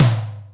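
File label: Room tom, Boss DR660, lt; drum machine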